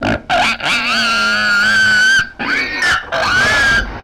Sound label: Monster Pig